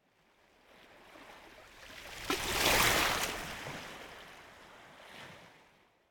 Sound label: Surf Water Lap Small Wave On The Coast; Hollywood Edge; sound designer's toolkit v2